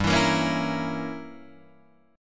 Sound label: G Diminished 7th, Guitar, Open D